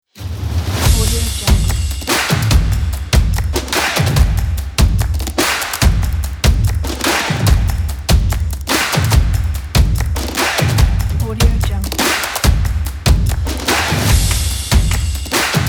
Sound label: energetic stomp clap